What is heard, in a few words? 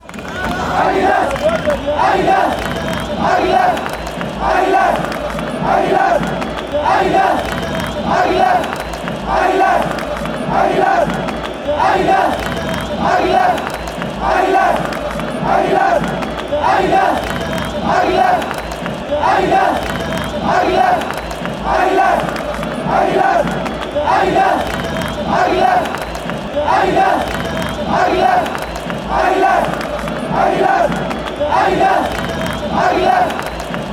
aguilas aguilas, fanchants